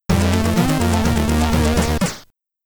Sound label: Bonus; Paranoimia; Commodore 64